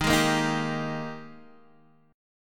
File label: Ab Major 7th Suspended 2nd Eb